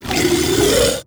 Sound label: swarm generic idle; Stellaris; other entities